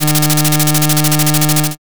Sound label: slot spin